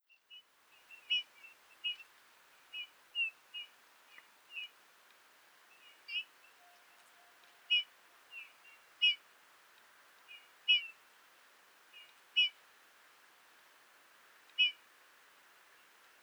Goudvink Pyrrhula pyrrhula

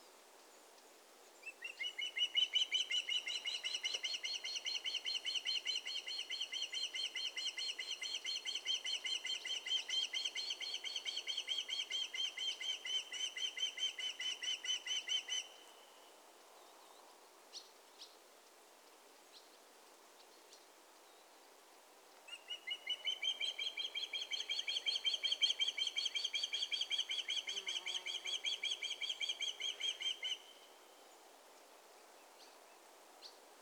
Visarend Pandion haliaetus

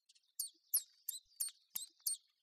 tjiftjaf

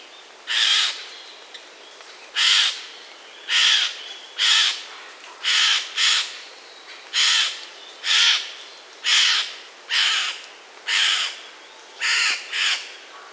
Gaai Garrulus glandarius

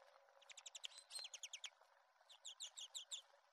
groenling

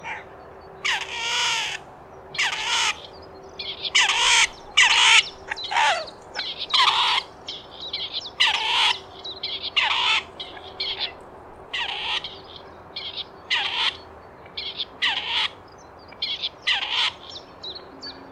Patrijs Perdix perdix

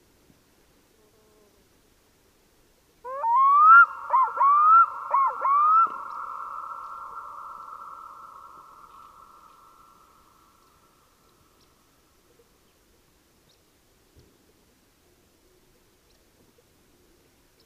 parelduiker

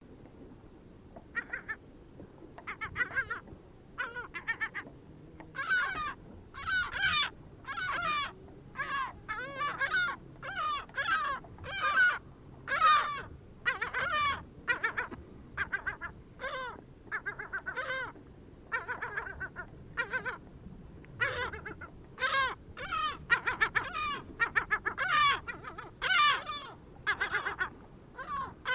kleine mantelmeeuw

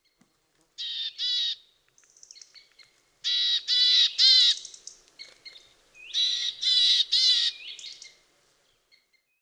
Matkop Poecile montanus

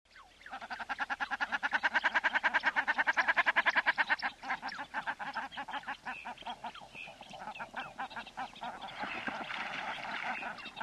bergeend

